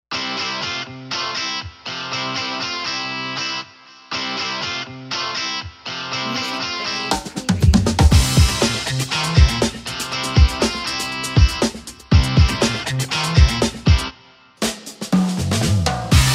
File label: Energetic Rock Guitar